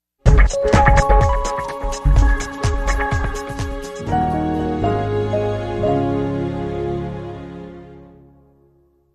promo upbeat branding bumper

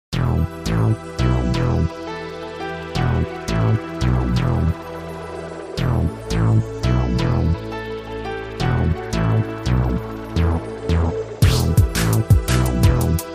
dark atmoshpere

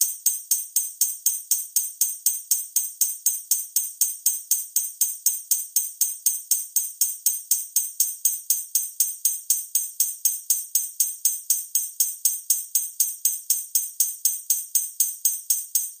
Tamborine 120 C; Beach Day

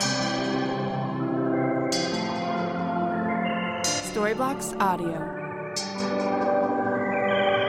Ambient House China Dream Pad 125BPM C

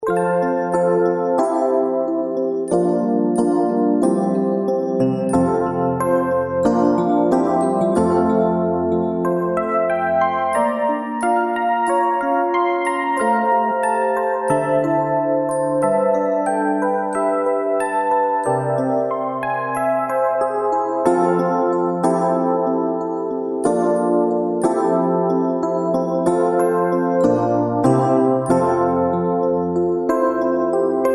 jingle bells

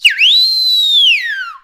Rap Vox

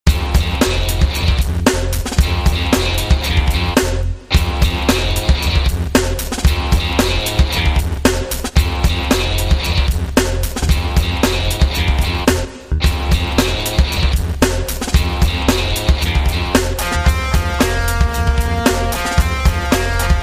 pocket fuzz

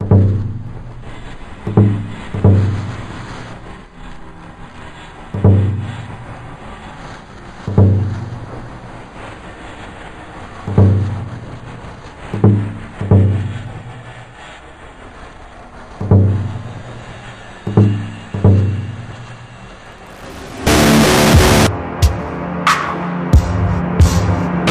tension

